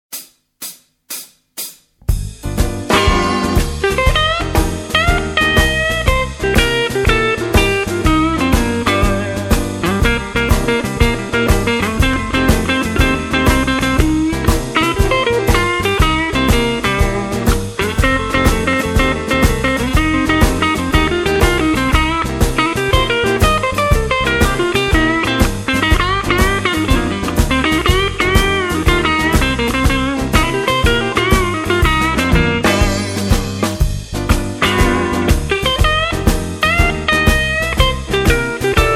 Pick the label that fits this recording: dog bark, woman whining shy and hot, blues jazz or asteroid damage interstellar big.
blues jazz